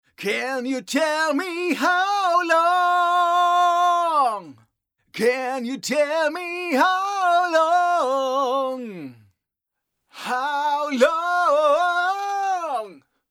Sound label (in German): Vocals Lewitt Niere